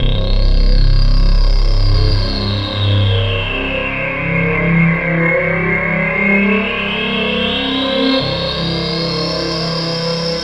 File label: Long Low Reso Sweep C; Trance Formation